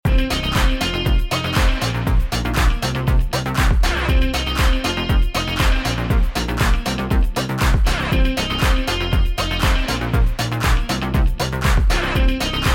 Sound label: Instrumental